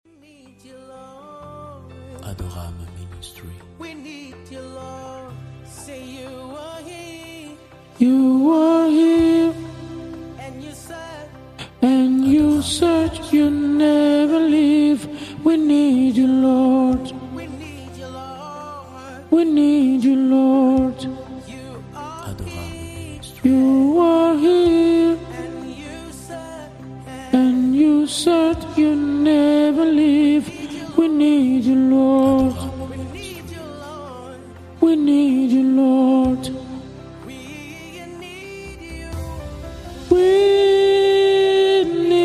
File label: Alto